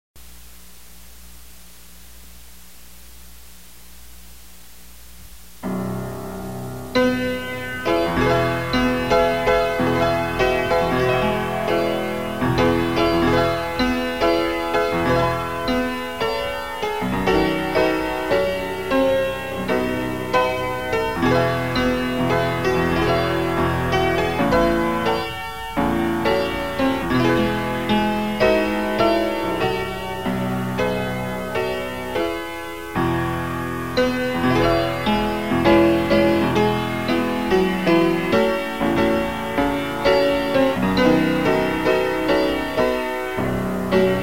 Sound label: PIANO